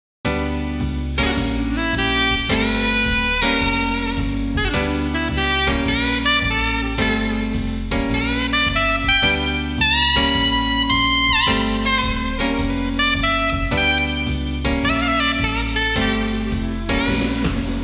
musica urban

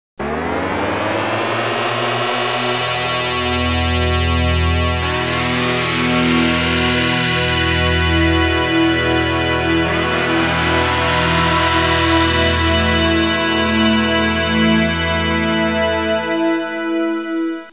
audio suonerie musica mistica

